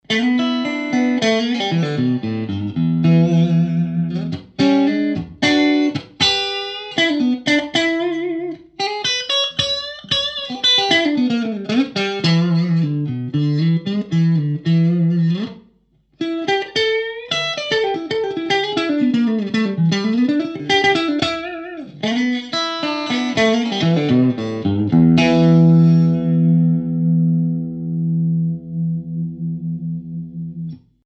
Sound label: clean middle, Strat; schwarzbach; VP-Strat-56